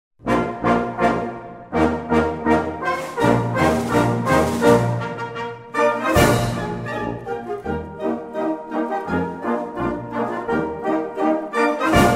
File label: Polka